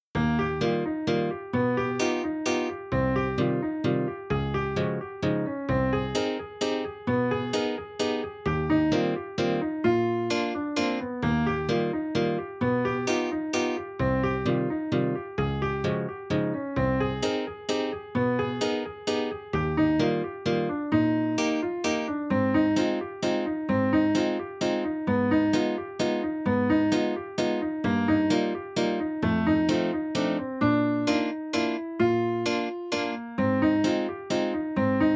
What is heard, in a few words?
Flowing Waltz in Eb and other keys